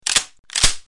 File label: firework launcher switch; weapons